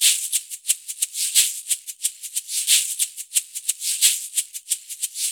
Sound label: ETHNO PERCUSSION 90; Beats; ethno2